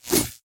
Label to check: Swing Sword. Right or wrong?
Right